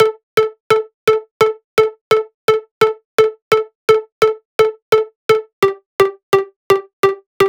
128BPM Synth Root A DRY; Vengeance Samples; Control Kit

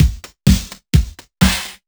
Housebeats 128BPM; Vengeance Samples

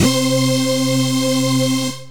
303 Acid C; Vengeance Samples

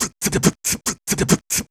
Beatbox Loops, Human Beatbox

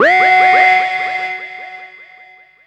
Synth Shots; Vengeance Samples

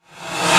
Short Fills & Reverse; Vengeance Samples

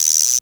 Minimal Perc; Minimal Percussion

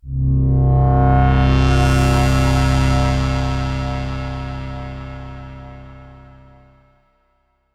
VFX Atmos

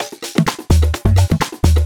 Houseloops 128BPM; Vengeance Samples

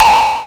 Synths A; vengeance essential club sounds-3

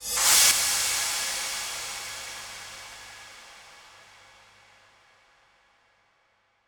Freefall Kit 138BPM Reverse Fx, E minor; Sound FX; Vengeance Samples

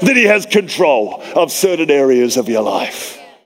Vocal Phrases; Vengeance Samples; Vocals

